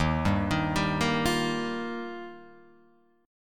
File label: D sharp Major Add 9th